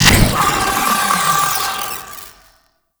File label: Sentient Player Scanned; Sentient Movement; Warframe; Sentients